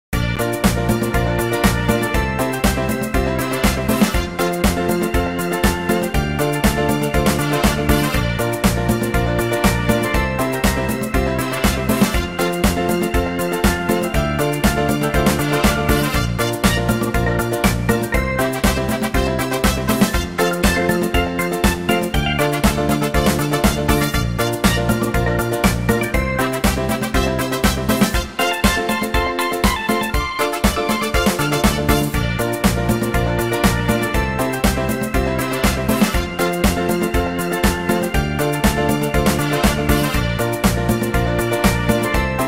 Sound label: Disco XG, Wavetable; YSXG50 VSTi; 4 MB Wavetable; MAGIX Music Maker 16 Premium